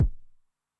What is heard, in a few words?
BD MOD [Pitch+Depth], 909mod, BASS DRUM